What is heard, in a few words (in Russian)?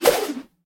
perevorachivayushayasya v vozduhe sablya